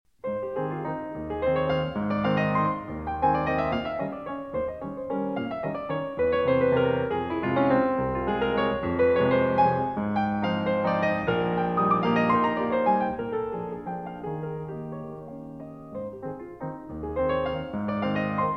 Waltzes, Moderato in A-Flat Major

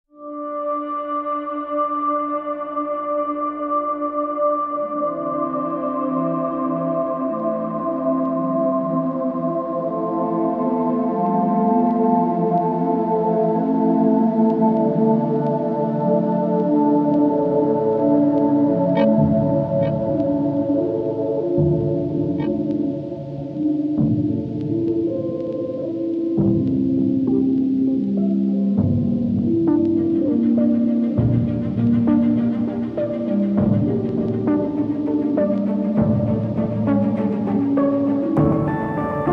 Electronic Variation

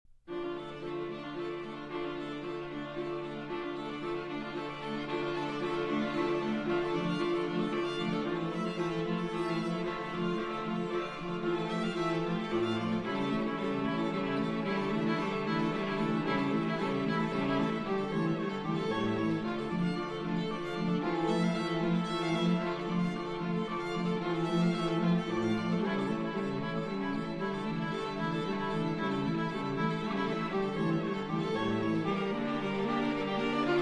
String Quartet

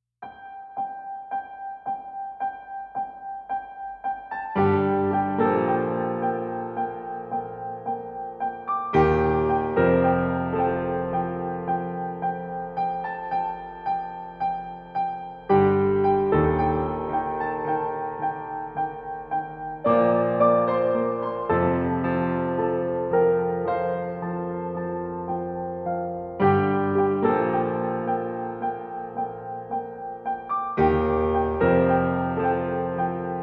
Piano Only

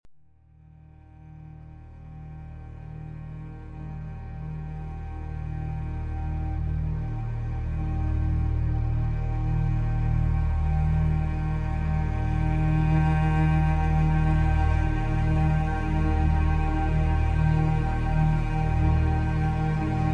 Cello Blues Drone Long version; Modena

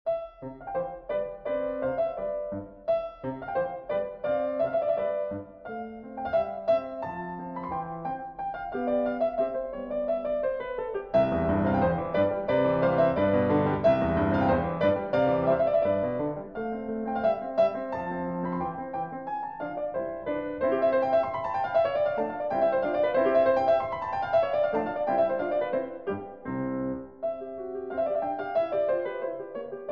Piano Sonata, C Major, Allegretto; Piano Sonata No. 10

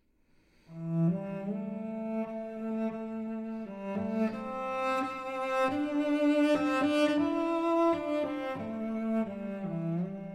Solo Cello

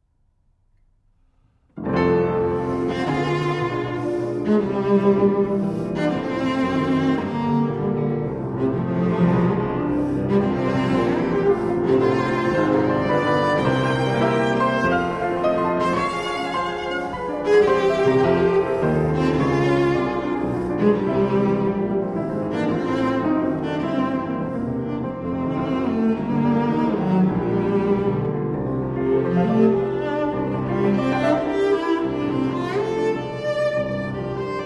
Cello Sonata, Allegro vivace; No. 2 in F Major, Op. 99 I.

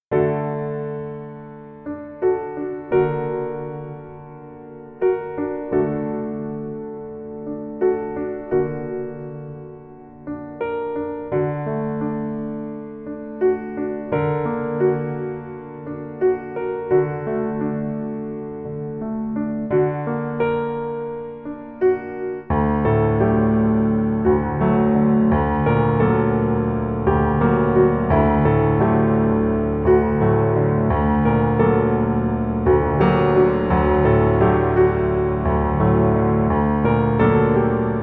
Instrumental

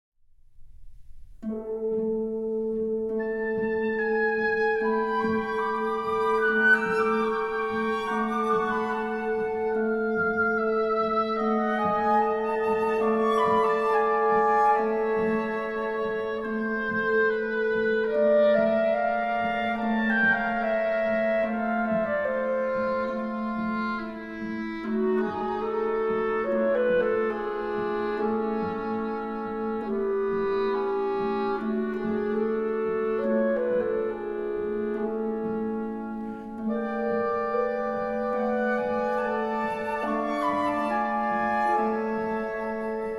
Polovtsian Dances Andantino - Allegro Vivo; Borodin Prince Igor, Act II; (Instrumental Version)

